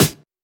Snares; Vengeance Samples; VEH2

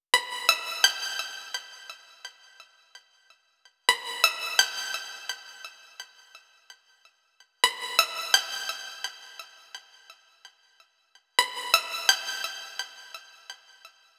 Synth Sequence, 128 BPM; Vengeance Samples; VTDS2 Song Kits; Rap To The Top

